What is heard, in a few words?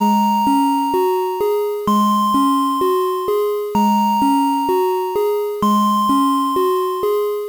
bgm Chip; ゆめ2っき